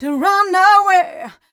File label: f mel e flat, fem Vocals